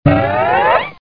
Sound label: stretch; How Many Bugs in a Box